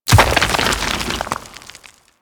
Arachnoid Camper Step Rock Debris; Warframe; Corpus

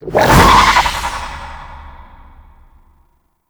Infested Collar Throw; Warframe; Infested Alad V

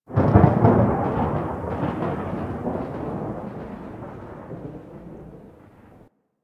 Hub Cephalon Suda Rand Thunder; Warframe; Ambience; Tenno; Syndicate Cephalon Suda

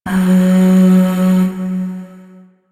Void Shawzin Oct One F Sharp; Warframe; Zariman

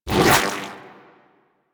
Shield Pickup; Warframe; Volt

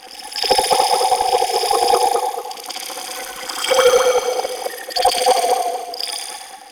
Primary Proj Loop, Weapons; Warframe; Cephalon

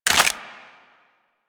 Inf Sniper Rifle Reload, Infested; Warframe

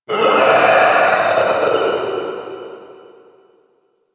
Infested Runner Dist Vocal; Warframe; Runners

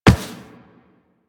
Walk Metal, Footsteps; Warframe; Operator

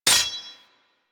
Melee Sword Impact Generic; Warframe; Weapons; Swords

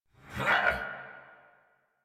Attack Effort; Warframe; Dax Knight; en

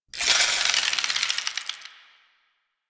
Mace Spindown; Warframe; Duviri; Duviri Dax; Weapons; Duviri Mace And Shield

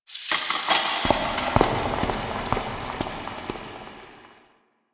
Cooking Loop Stop; Ambience; Warframe; The New War; Quests; Zariman

